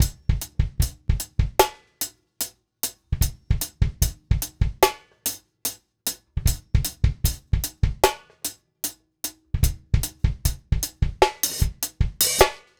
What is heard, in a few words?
Beat